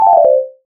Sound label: lose; Monopoly